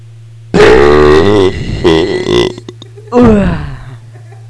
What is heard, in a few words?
burp